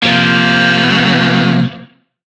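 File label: Shawzin Pentatonic Minor Chord A; Music; DE; Warframe; Lizzie Shawzin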